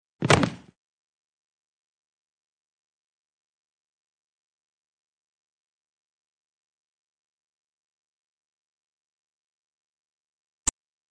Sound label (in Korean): Fights, 싸움-전투; 헐리웃특수효과음